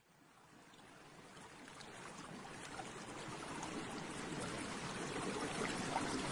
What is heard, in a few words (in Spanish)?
agua y burbujas water and drops efecto de sonido